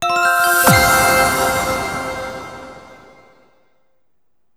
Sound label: JINGLE CHARA RAINBOW; maimai